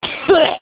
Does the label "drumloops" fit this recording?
No